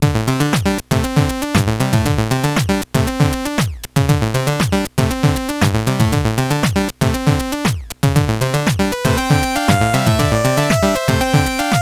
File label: BGM; Spiral Wave; Hudson Soft; PC Engine